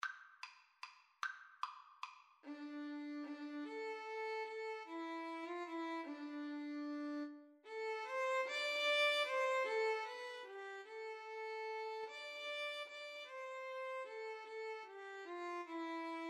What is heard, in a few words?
scarborough fair, Violin Cello Duet